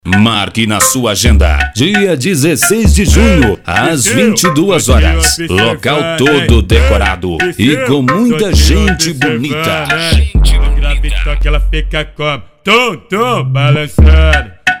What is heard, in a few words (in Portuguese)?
funk automotivo; funk automotivo 2017 uberlandia mg